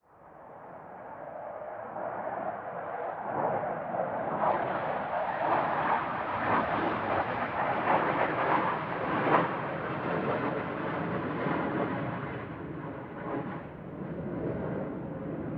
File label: Jet fighter